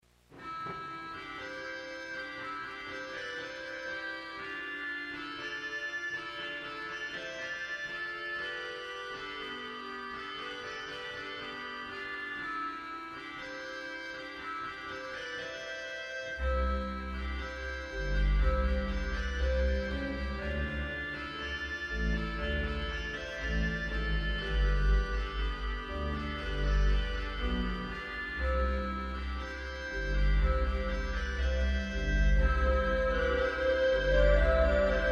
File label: OrganTeq Reeds